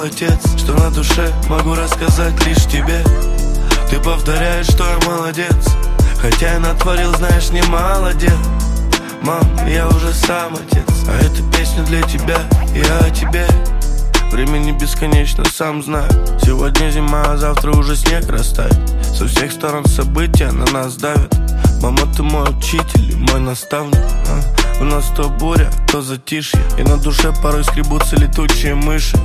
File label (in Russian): rington